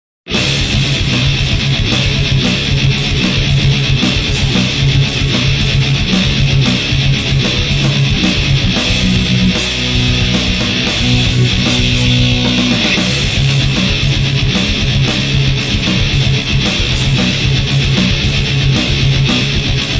Rock Tracks

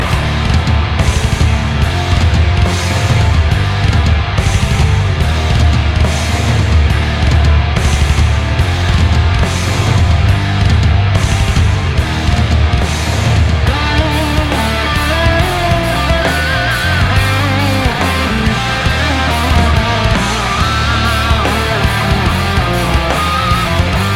Solo

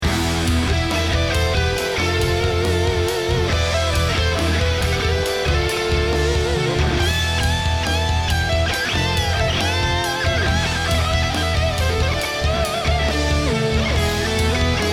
Guitar Solo

